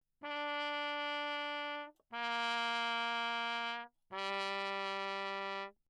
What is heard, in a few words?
TRP MUTE Bach low; Perinétventiltrompete